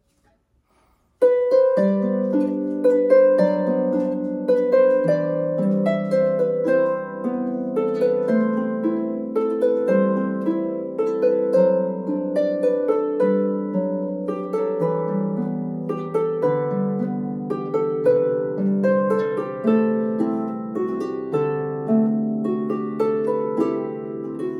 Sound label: Music Box, Folk Harp; This whimsical piece is an imagining of a music box; Zee’s Music Box; An original work by Philip Hayden